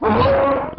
orc attack

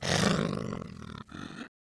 attack

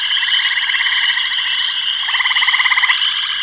frogs and insects

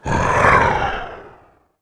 ATTACK

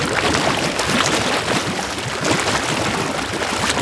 RIVER RAPID

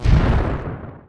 thunderball collide

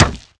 hammer

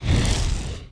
THUNDERBOLT CAST

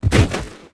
FALL HUGE

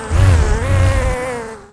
DIE

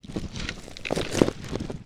COLUMN MOVE

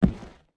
fall small

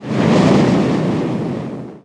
stormfist cast

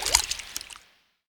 BLADE REMOVE

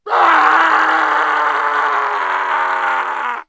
ZOM DIE

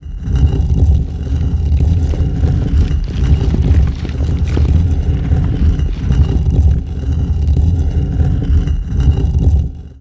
stone mill

